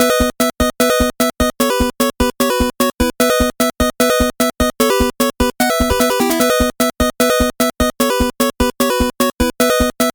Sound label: 8bit bgm normal